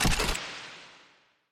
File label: case open